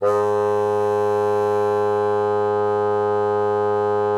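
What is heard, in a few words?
bassoon forte G#2; Xsample VOL-6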